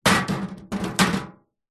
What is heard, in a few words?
metal small container bin empty knock over or drop on concrete; zapsplat